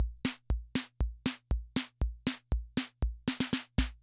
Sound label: Loop, rhythm 55, classic machines